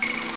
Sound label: aliens